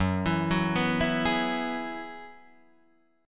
Gb 7th Suspended 2nd Suspended 4th, Guitar, DADGAD